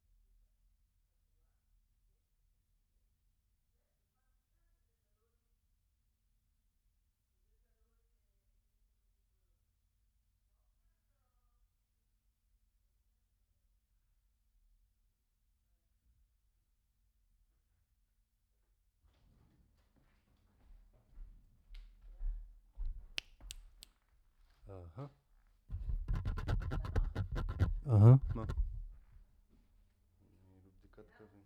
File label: BARI SAX D